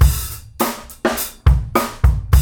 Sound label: GROOVE; E-MU Producer Series Vol. 8 – Platinum Phatt